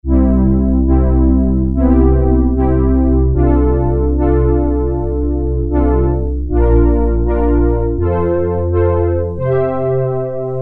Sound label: Flute - Horn, Roland Juno-106; Factory Preset